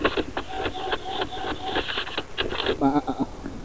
fax

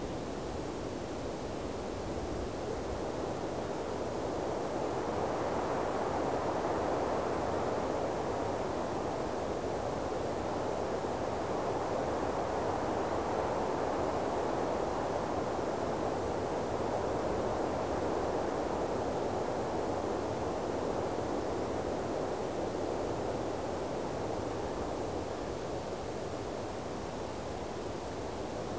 wout wind

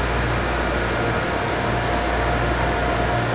Machinery

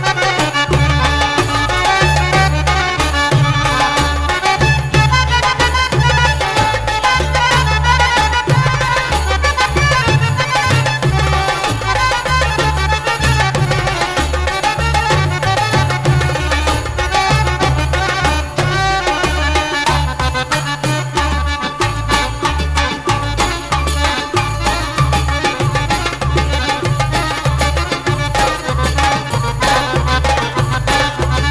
arab tabla

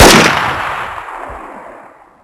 luger shoot, weapons; svencoop; ww2projekt

